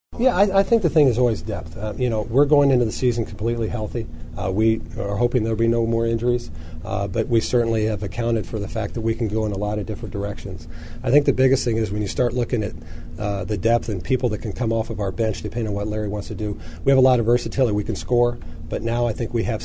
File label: BELL